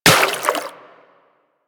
Water Sprayer Beam Impact Flesh; Warframe

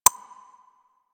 Hub Concourse Computer Beep; Warframe; Ambience; Tenno Hub Concourse Fragment

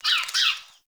Fey Flock Attack Dive; Powers

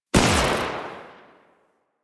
Pistol Shot, weapons; Warframe; Thanotech

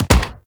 Weapon Punch Bow; Warframe; Weapon Punch Layers

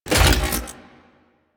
Iron Blast Charge Complete; Warframe; Warframes

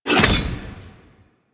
Flame Spear Pickup; Grineer

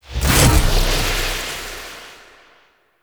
Weapon Void Mode End; Warframe; Weapons; Duviri; Incarnon Adapters; Incarnon Void Switch

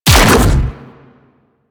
Electric Tether Shot; Railjack

